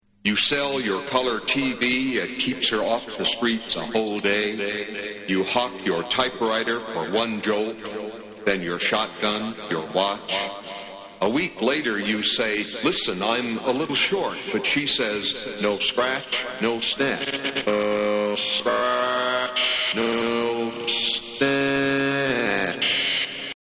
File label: Acapella